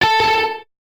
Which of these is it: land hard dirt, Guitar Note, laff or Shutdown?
Guitar Note